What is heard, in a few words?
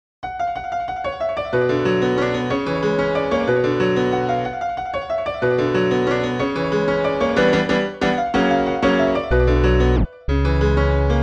Instrumental; Friday Night Funkin Funkin Peanuts; Art Block